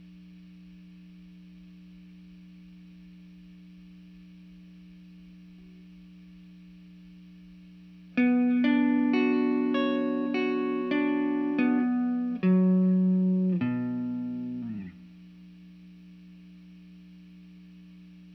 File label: GTR